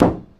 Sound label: Street Ball Hit Car; SFX Kit